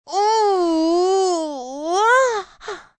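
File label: Sex Ooooo Ah Female; Groaning; Voice Box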